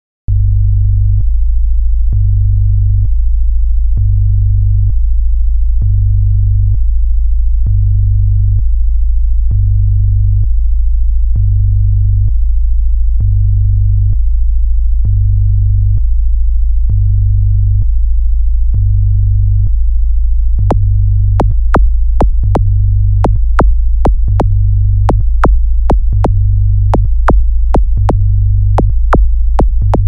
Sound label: Deep bass